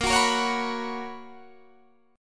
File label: B Major 7th Suspended 2nd A sharp, chord shape